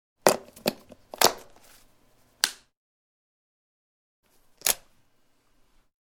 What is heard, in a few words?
3 Pin Plug, Plugging and unplugging of domestic UK standard plug with switch.; Clicks; Objects